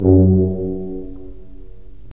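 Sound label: brass tuba; LA Composer vol. 3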